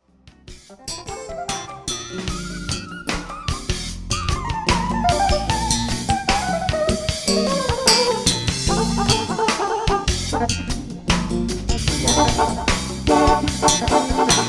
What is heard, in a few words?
FBass slap; myoldfbass